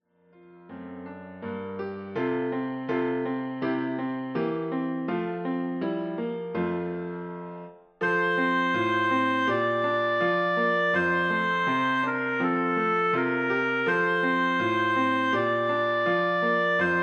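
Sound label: oboe; O du froehliche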